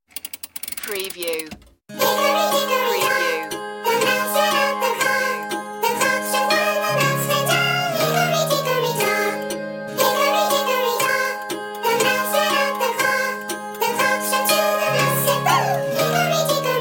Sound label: mouse vocal